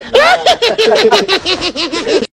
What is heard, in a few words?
yehehehehehe